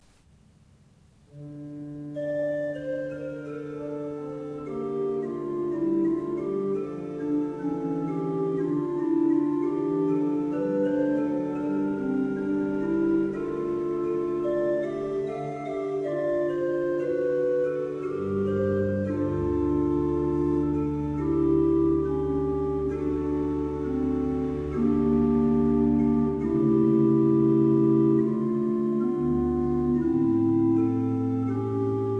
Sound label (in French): ORGUE; DIM RAMEAUX